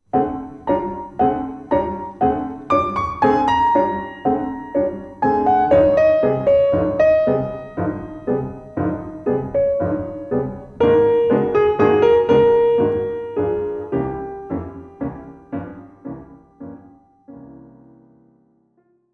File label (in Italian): Sonata N.1 Per Pianoforte III Tempo